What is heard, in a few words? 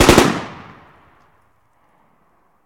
assault rifle distant; Zomboid 41.78.16; Project Zomboid